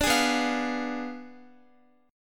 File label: B Diminished 7th B down Guitar; strummed; tuning 4F,4A,5C,5G,5C,6E